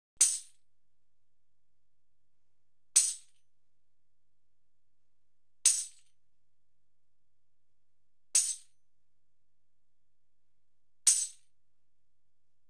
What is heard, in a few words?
Instrumental, Tambourine